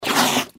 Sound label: gecko scream; npc